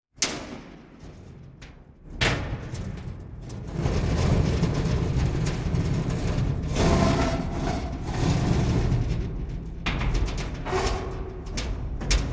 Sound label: steel frame dragged long; fvtt sonniss gdc audio